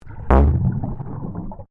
UNDERWATER MOVEMENT IMPACT, Submerged impacts created by dropping a weighted rope; Submerged impacts created by dropping a weighted rope into the ocean; Splash Sound Effects